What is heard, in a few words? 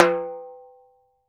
TIMBA RIM, TIMBALES; Cuba; AKAI S6000 CD-ROM - Volume 5